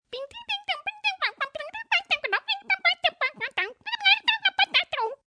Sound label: Aliens Silly, Alien, silly, playful (human voice); Aliens (Sound Effects:Science Fiction)